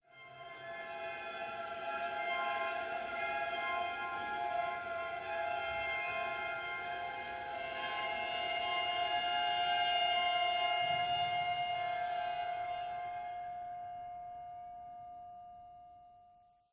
Ambient Timer; Brothers in Arms 3: Sons of War; Android

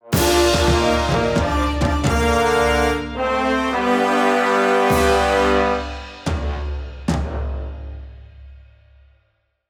Jingle Boss Defeated; Mario vs. Donkey Kong

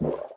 Collision small

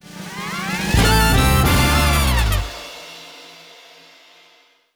victory; blitz brigade; android

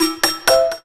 KEY Hold Finish

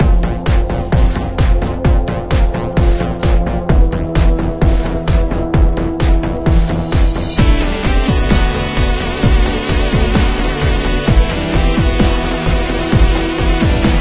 Boss Loop

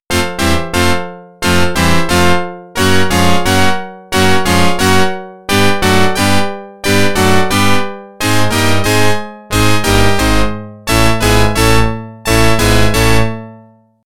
Organ

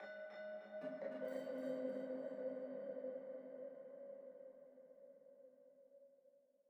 Env Field Night Cave

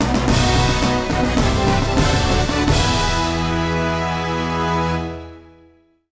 Fanfare; undefeated windows gamerip 2015

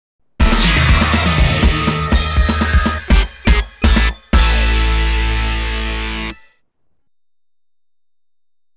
game over; Loving Deads The House of the Dead EX

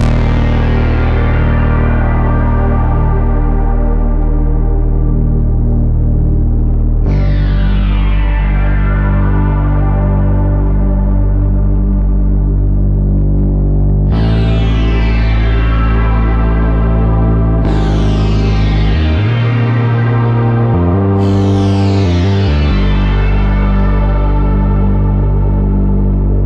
City Ruins Stem; Epitasis

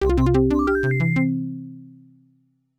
Wave Complete; Off the Wall

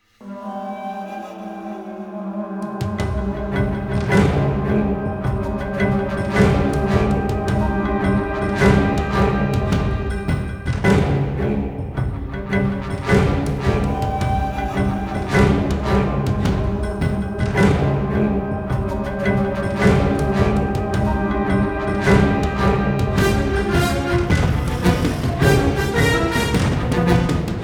Forest Battle

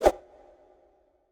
Swoosh; Trivia Crack

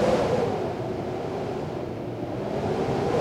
Wind Speed fast; Puddle; puddle plus ios gamerip 2018

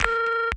Phone In

